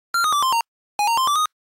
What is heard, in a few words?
walk slow